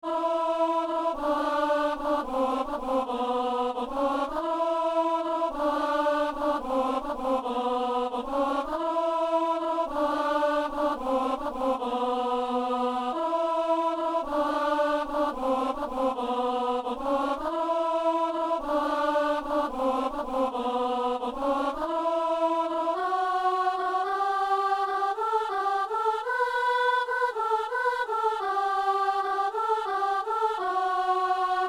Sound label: alto; Messe du 17 janvier à Saint-Denis; Notre chemin